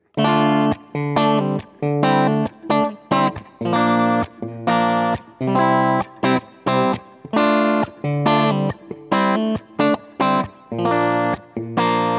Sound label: Charvel Signature Middle PU Bridge Humbucker Clean; Marco Sfogli